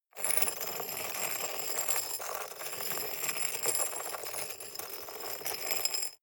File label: scrape stone shard soft